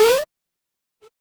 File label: retro game jump